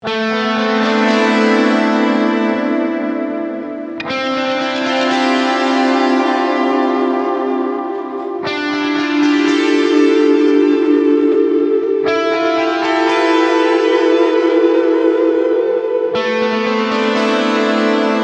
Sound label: A Aeolian Arp Delays